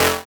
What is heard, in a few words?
Synth Sound; Vengeance Samples; VEE Synth Shots